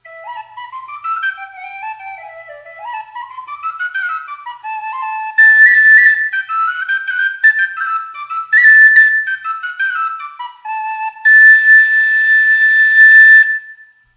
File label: tinwhistle d; macnic